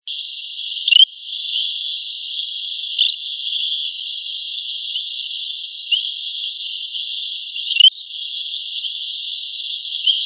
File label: Craugastor alfredi